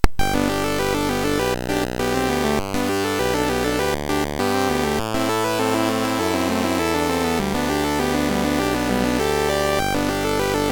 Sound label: MOS6581R3, GAMES; Slinky